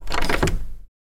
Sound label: Dead Bolt Lock; lock & keys